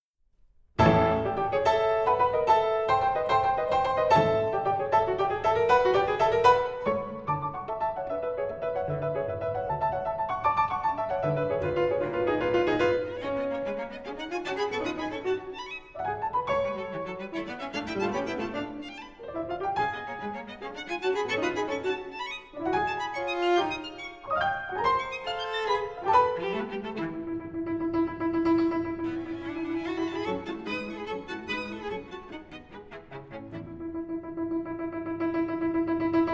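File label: piano trio; warner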